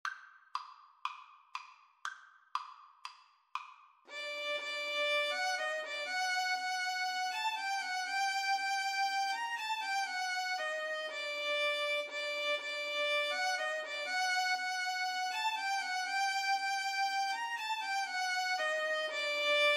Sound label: hava nagila, Violin Cello Duet